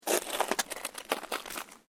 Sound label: SNOW CREAK